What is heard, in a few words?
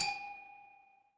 glass clink